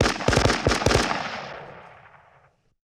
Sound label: Machine Gun Multiple Short; Weapons & Firearms; Sony Pictures Sound Effects Series Volumes 1-10; Sony Creative Software